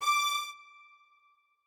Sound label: strings